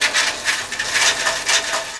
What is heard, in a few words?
Swirl Blades Move; Playable Tutorial Level